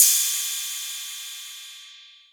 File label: Cymbal, Novation Drumstation